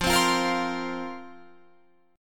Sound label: F Suspended 4th F down Guitar Open F; Fsus4; strummed